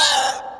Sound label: horn die